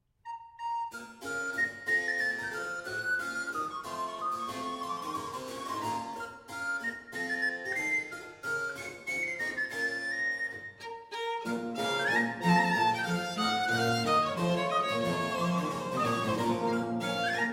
Sonata in D Major from Trio Sonatas on Scots Tunes; She rose and let me in; J Oswald